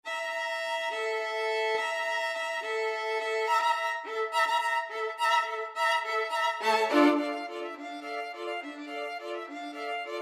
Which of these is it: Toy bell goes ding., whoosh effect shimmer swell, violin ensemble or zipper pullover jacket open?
violin ensemble